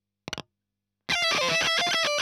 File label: EL Fuzz End